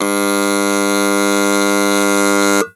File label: performance starting buzzer